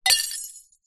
impacts glass piece broken light bulb fluorescent drop break smash